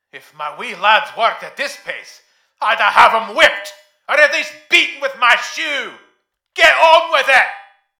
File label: faster